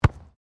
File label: SOCCER BALL KICK FROM HANDS